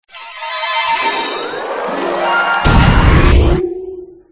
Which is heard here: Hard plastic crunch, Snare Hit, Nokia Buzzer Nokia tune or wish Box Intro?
wish Box Intro